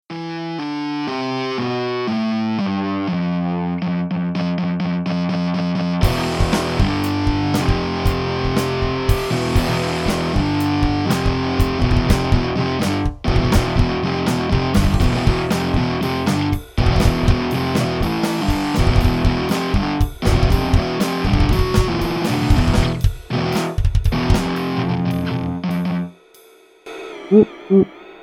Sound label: sloppo metal; CRAPPY METAL - FIRST EXPERIMENT WITH GARAGEBAND AMP OVERDRIVE SIMULATORS